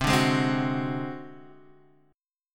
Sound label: Ab 7th Flat 5th C, chord, guitar